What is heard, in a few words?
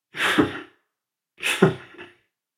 laugh; Lucian